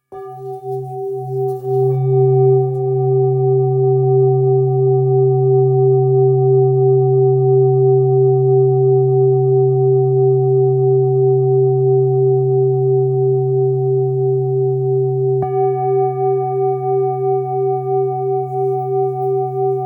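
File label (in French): Bol super lune belier do, bols chantants tibétains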